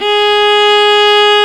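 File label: viola G#3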